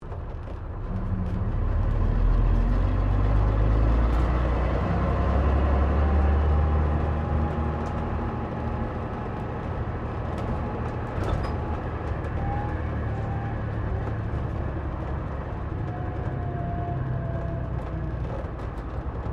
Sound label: Bus Double Decker Bristol VR Interior Driving